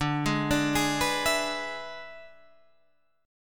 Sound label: E 7th D, guitar